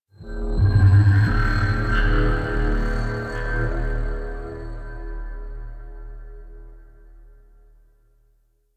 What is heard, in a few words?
Tech Texture Large, Ambience; Warframe; Cephalon Weave; DE